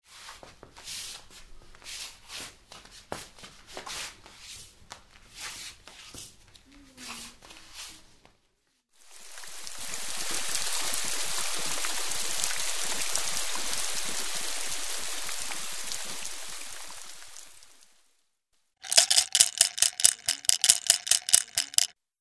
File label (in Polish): szuranie deszcz grzechotka; 4-latek; dzwieki jesieni; druzyna marzen; karty pracy